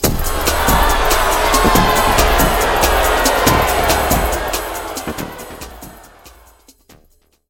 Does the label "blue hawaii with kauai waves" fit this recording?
No